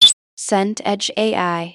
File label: blip up